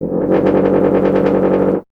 BRS TUBA, Solo Instruments

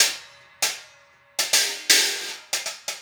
Hi Hat Pattern

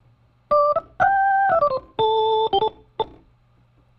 Organ, 70s Analog Funk

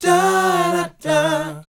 BOYZ C, Vocal Planet R&B & Dance; ILIO

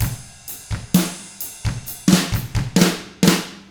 129 ROCK, Drums; ILIO; Double Platinum Drums 1

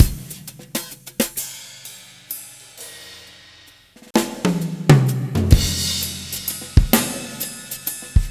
drums six8 130; Quazibeat; Rock Heaven Drums

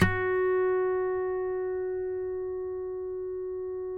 HARM F#4, Guitars; Spectrasonics; Hans Zimmer Guitars VOL-2; FNGSTYLE PFM

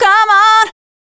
FEMALE, Dance Vocals

